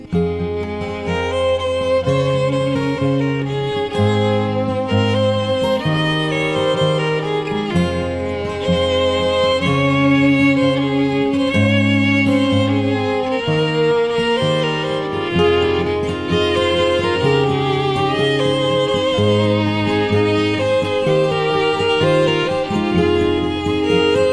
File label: Violin and Guitar Cover